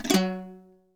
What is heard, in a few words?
HALAM PICKS; Best Service; World Colours